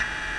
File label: Write